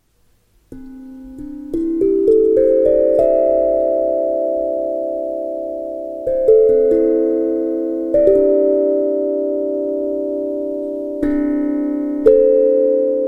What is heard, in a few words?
C Major Pentatonic